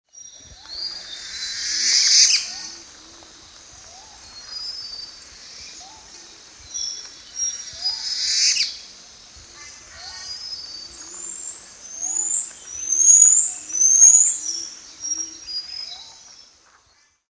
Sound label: cerulean cuckooshrike